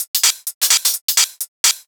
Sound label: Hihat Loop 128 BPM